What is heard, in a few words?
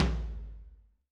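Lo Ambient Floor, Alesis SR16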